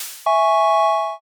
reveal

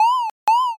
warning light

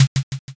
m keytap

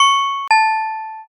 pinpon bell

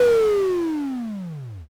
power off

